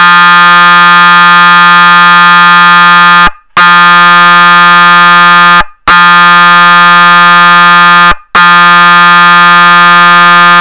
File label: syrena straz